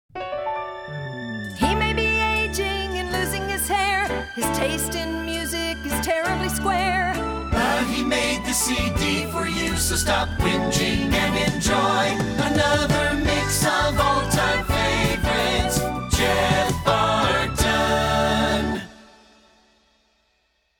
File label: Jinglebells